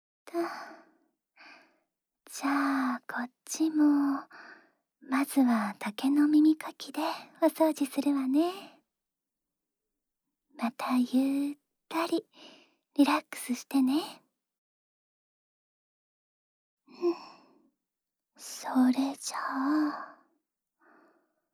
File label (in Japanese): 竹耳かきで右耳の耳掃除; 【耳かき&添い寝】ママといっしょにおねんねしましょ 〜おっとりママ編〜